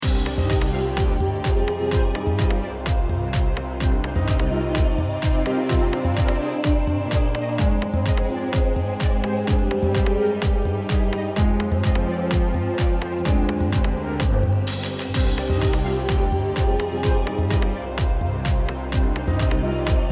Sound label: battle loop